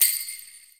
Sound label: EQ Perc, Percussion Mix